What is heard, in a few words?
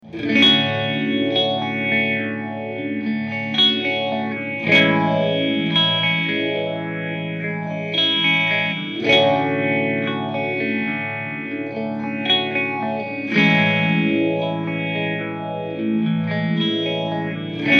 Phaser; Michel Top